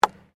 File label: GOLF BALL IMPACT TREE; Sports